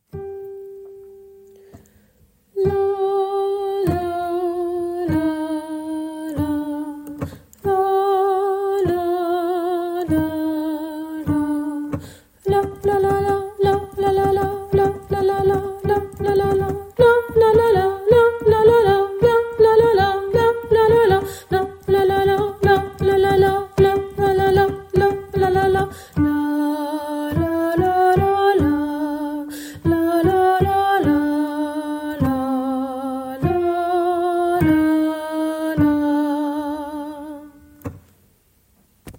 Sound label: shchedryk alti